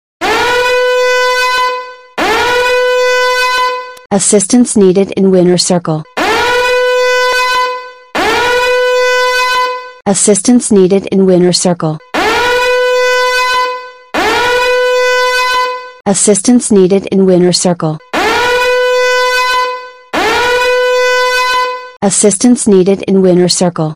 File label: Siren